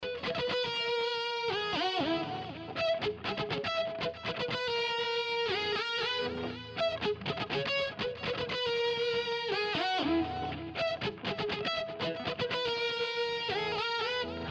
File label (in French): gratte